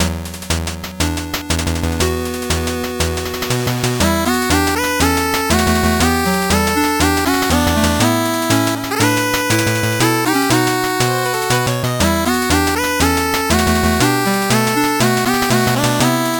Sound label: 8bit bgm slow